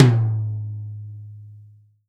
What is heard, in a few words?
TOM, Real Drum Kit